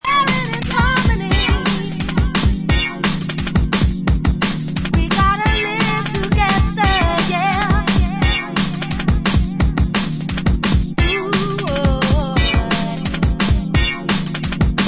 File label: Drum Bass